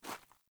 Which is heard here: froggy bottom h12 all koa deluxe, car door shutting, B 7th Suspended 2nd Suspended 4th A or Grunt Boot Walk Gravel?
Grunt Boot Walk Gravel